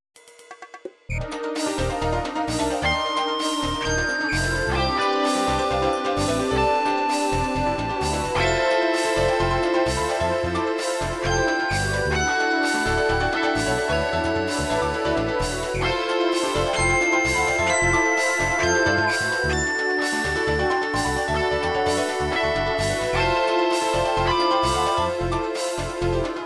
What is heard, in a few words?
jazz